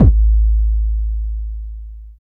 KICK 2; East West; Phat & Phunky

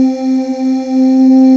SYNVX; Greytsounds; Scott Peers Sound Engineering

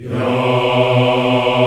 VOX African Yas; African Aahs; Roland LCDP11 Africa VOL-1

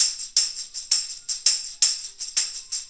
Tambourine

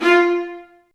STR, STRINGS; Roland - LA Composer vol. 1

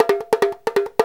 INDIA PERC

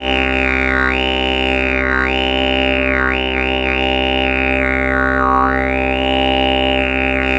C drone simple, Didgeridoo; Big Fish Audio - Didgeridoo and Other Primitive Instruments

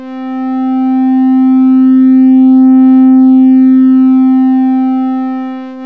2x triangle, raw osc pads; Zero-G Ambient 1

